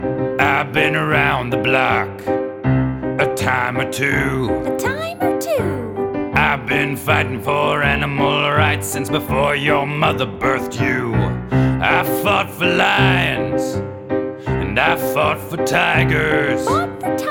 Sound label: Singing Broadway Style